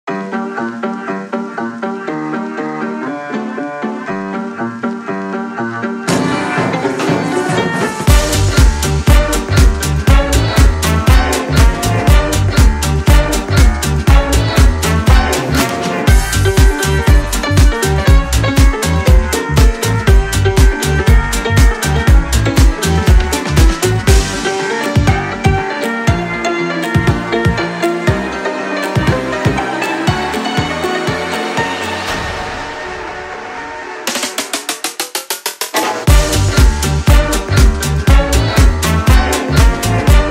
Ballade; Ballade No. 1

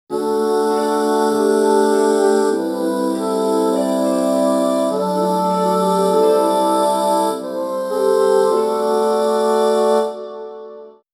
BGM Elegant

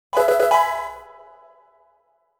Level Up!

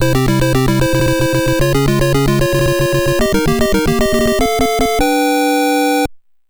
Super Mario Bros. Sound Effects World Clear; Super Mario History 1985-2010

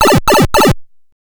Pipe Travel + Power-Down; Super Mario Bros. Sound Effects; Super Mario History 1985-2010

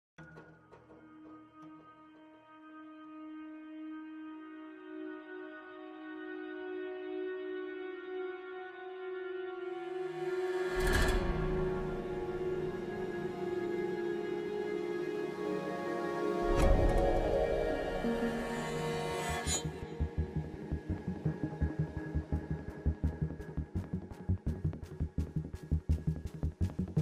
Ambient; Borderlands 2 (UST); Wildlife Exploitation Preserve

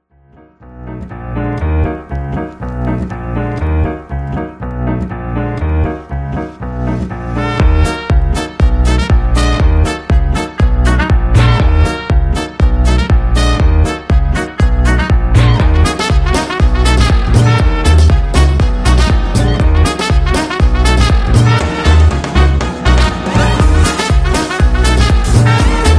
Cool Electro Swing Dream

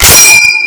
ping; Titanfall2; R2Northstar; Garand7-2.1.0

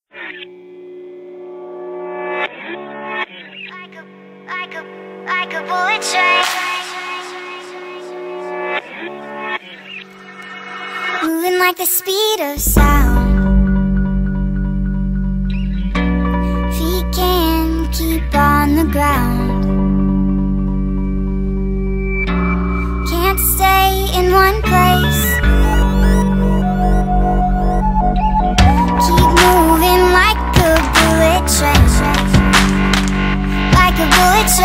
nightstep

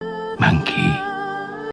Monkey; Meme Sound Effect

